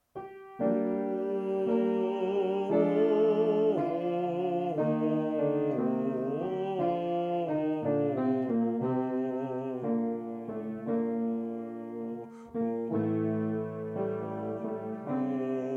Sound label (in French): images alto